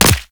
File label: Player Bullet Impact Flesh; Warframe